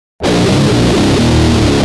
G Jam, Guitar; Industrial

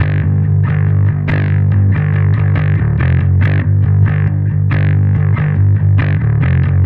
Bass

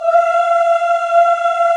VOX, African Aahs; Roland LCDP11 Africa VOL-1

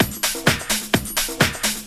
Discohouse Loop 128 BPM

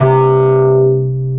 BASS L, Drum & Bass 160 BPM

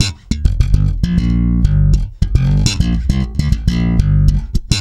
G, hard funk, bass; Spectrasonics; Bass Legends

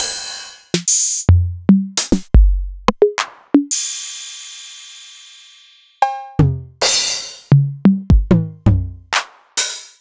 Novation Drumstation

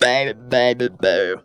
BABY BABY BA, Funky Vocals; USB Soundscan vol.18; AKAI

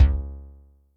BD bass, Electric Kick, Yamaha DTXpress; drum machine

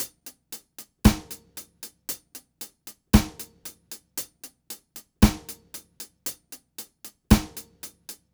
One Drop, Drumset; Sonic Foundry (Sony Creative Software); Joe Vitale Latin Percussion

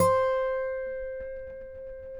Finger C 5 R, Guitars; Spectrasonics; Hans Zimmer Guitars VOL-1

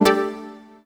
SYNTH; Polyend; Legowelt

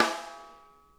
RIM, snare; Sonic Reality; Interactive Drum Kits

